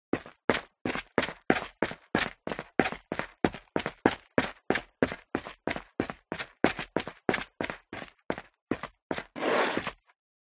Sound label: FOLEY STEP Dress Boots Concrete Grit Walk Sequence; smartsound